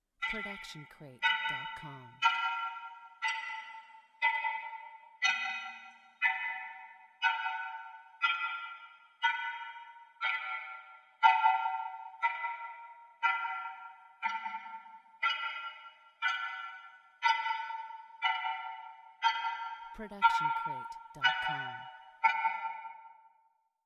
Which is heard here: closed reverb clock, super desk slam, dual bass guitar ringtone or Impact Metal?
closed reverb clock